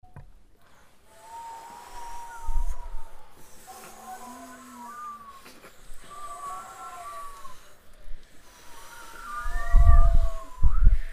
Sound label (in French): Ambiance sonore